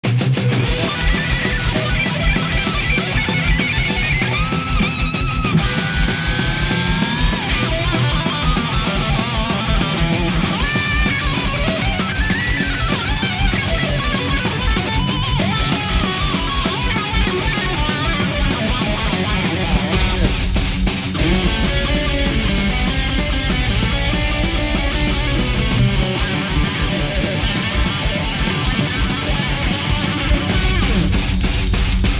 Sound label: Solo